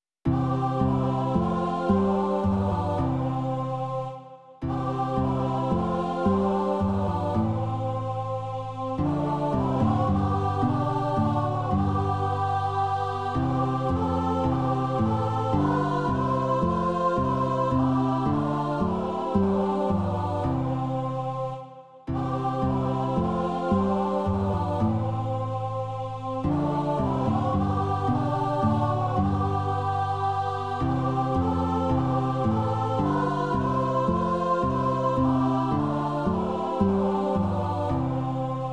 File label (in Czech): ženský sbor a kytara; Jak jen se odvděčím; Imaginativní verze